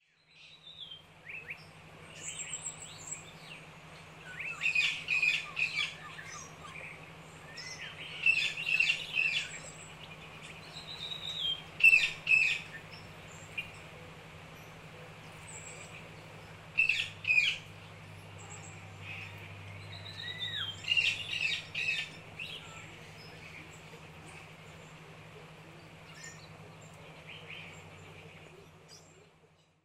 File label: sleep loop binaural beats jungle atmosphere